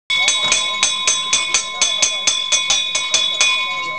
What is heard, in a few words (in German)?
glocke